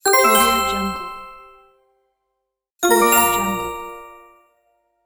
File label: bright achievement; sounddivision